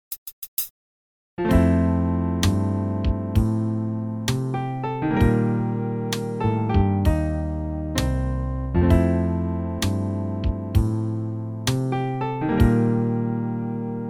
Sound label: So Saxy; Soundzabound Volume 1